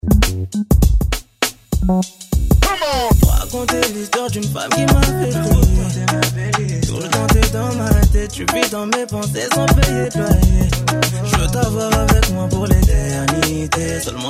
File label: Club